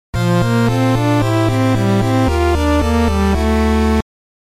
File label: Item Obtainment Jingle; Metroid